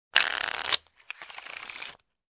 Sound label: Play Cards Shuffling